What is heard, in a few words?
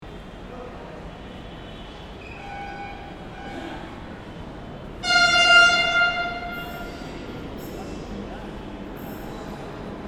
Underground metro distant crowd activity; station